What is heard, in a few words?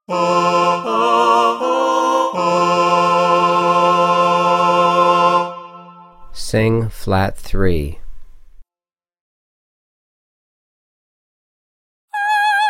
F major b3 Voice Soprano; contextual ear training voice edition